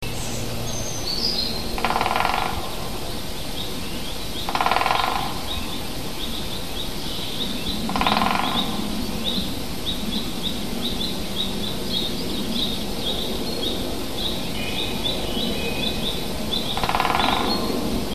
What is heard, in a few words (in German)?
vogel heimisch; biologie; wald; april